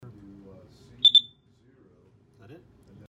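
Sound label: CO Alarm End Of Life Warning Beep; Kidde; EOL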